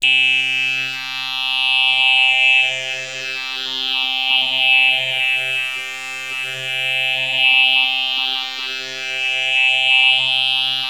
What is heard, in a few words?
Access Virus B C3; Synthworx